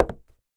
SFX Footstep; butchers games

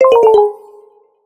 App Error; butchers games

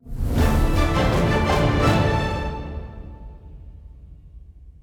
jingle victory; shortcutrun; butchers games

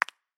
High Heels; butchers games

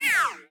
sfx enemy robot fall; knockemall

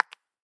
High Heels

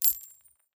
collect coin

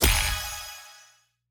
sfx envir boost champignon; shortcutrun

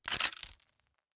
photograph

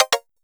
Remove Money

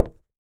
SFX Footstep

